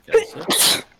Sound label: sneeze